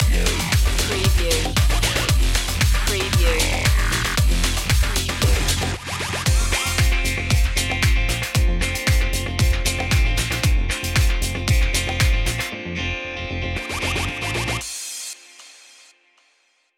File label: disco stepping